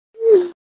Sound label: iphone message swoosh